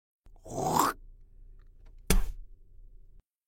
Spit

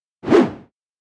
Whoosh; transition

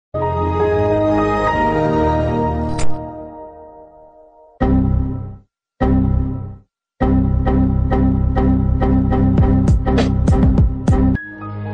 windows sound; cloth challenges

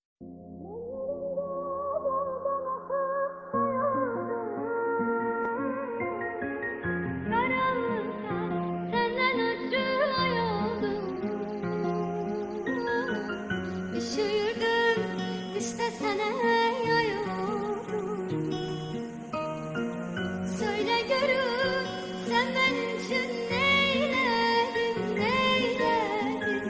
sad turkish music; world

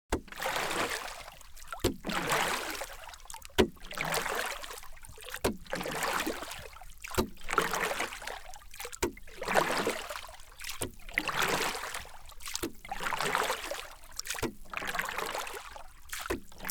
Rowing With Oars On The River

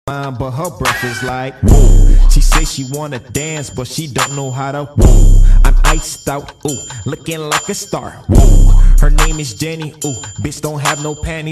cloth challenge wooh; tiktok; challenges

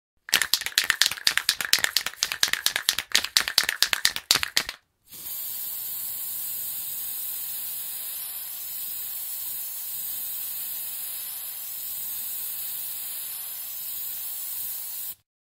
spray; صدای اسپری نقاشی

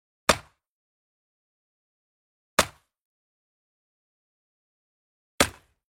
axe tree hit

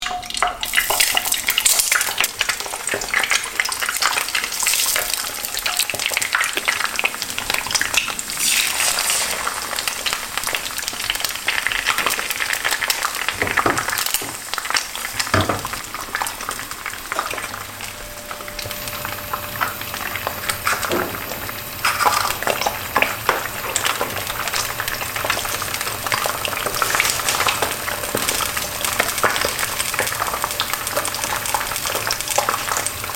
Cooking Fried Eggs Sounds, kitchen

